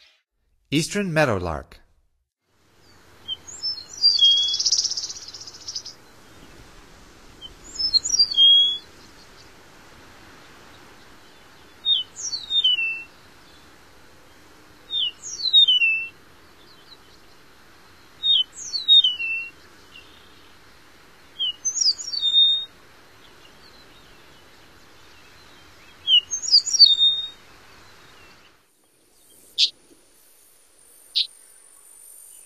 Eastern Meadowlark, Songs of Birds of North America; Stokes